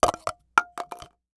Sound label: Cup Drop Plastic; household